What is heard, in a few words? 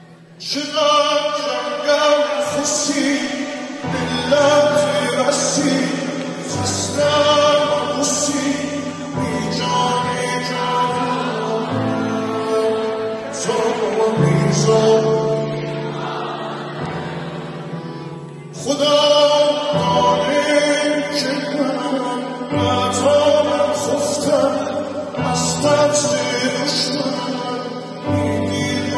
Piano Version